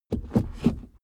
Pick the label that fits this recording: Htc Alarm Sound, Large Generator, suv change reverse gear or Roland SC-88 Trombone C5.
suv change reverse gear